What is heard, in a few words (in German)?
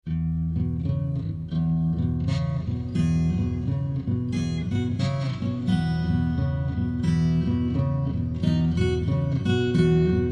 Vorspiel und Choral